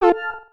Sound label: rec end; waze